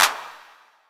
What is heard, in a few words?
Clap, Drum Percussion Single Hits; Zero-G; Nu Disco